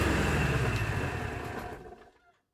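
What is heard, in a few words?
sound when engine was switched off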